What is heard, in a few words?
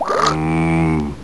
gurp; quake2; homer1 4